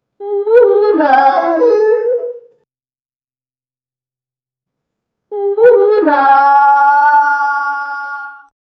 the sound of lazy capybar, mammals

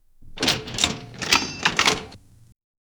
realistic vintage cash re, store

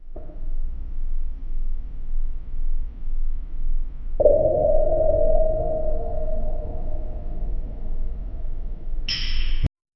echoing underground

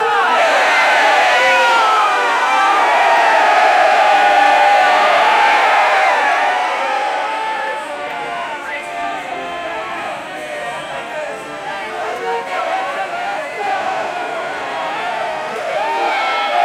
crowd reaction to stage p, music venue

